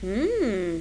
hmm

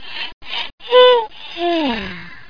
YAWN

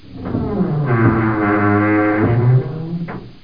door crk

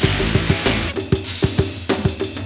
rock

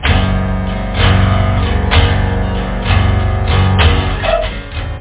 melodie, guitarras

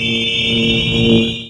enrage level beam; Earth and Beyond; EA Games

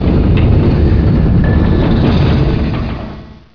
mtl slide, doors; Hexen2

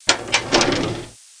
File Cab

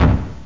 dirt guitar

